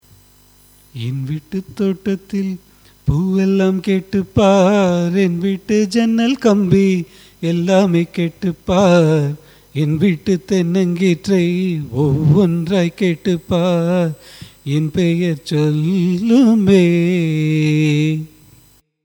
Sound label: raga senjurutti; carnatic music; cine